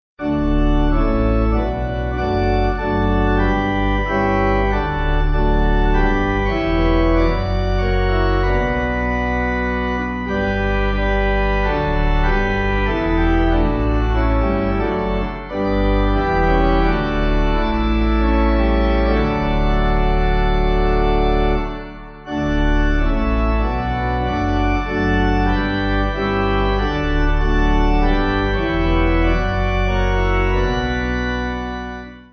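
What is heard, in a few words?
Thou Art The Way Pipe, Pipe Organ; 8.6.8.6; Metzler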